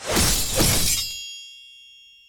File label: sword slash